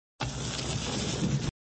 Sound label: Sound Ball lock sound effect, flipper; Ball lock sound effect v4